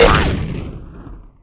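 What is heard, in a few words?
mine explosion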